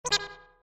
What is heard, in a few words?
meep mop